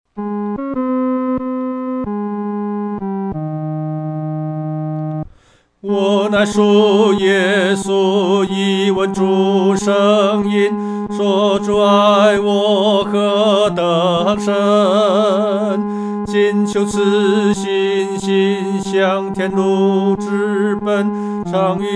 独唱 第三声

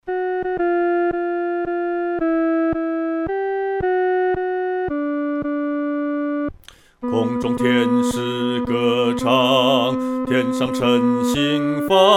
独唱 第二声

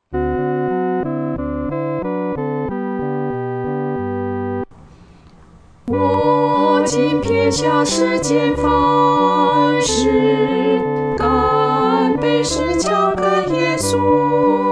合唱 女二声

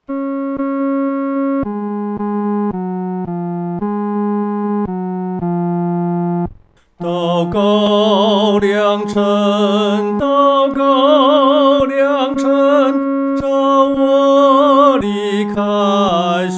独唱 第三声

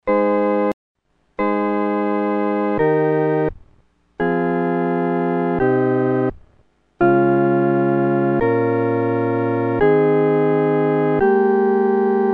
合奏 四声部; 阿门颂（二）

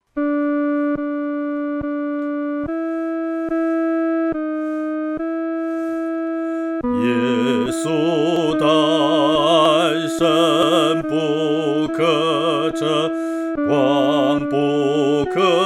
独唱 第二声

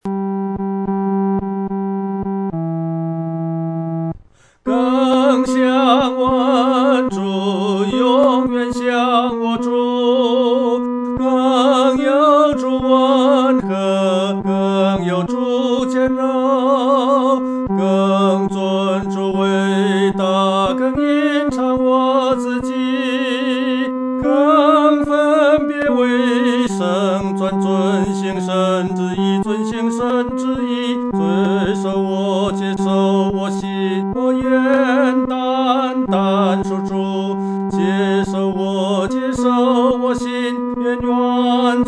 独唱 第三声

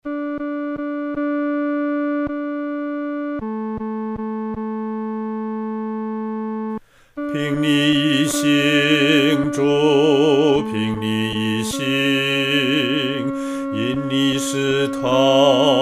独唱（第二声）; 凭祢意行

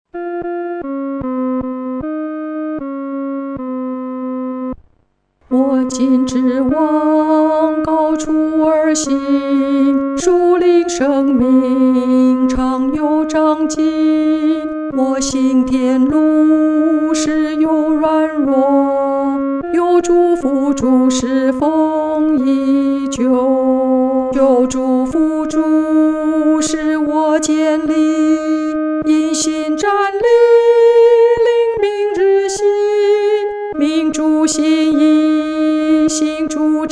独唱（第二声）; 向高处行

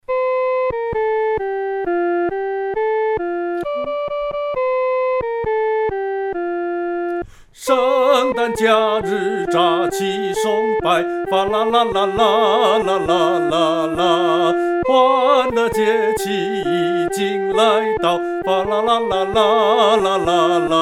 独唱 第一声

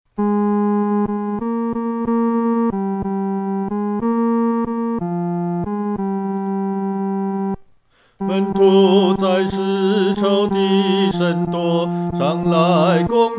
独唱 第三声; 靠信心得胜

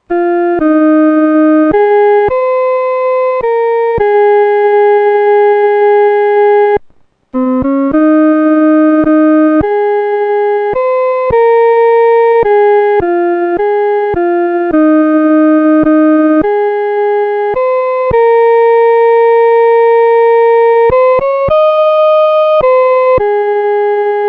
独奏 第一声; 岂能让主独背十架

